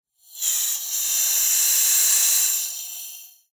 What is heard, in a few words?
Pneumatic Release, Scifi; Air, Valve, Science, Fiction; Spacecraft; BlastWave